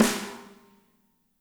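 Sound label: snare right; Spectrasonics; Burning Grooves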